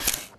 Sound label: switch